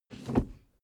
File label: suv push forward gear straight; verkehr transport; autos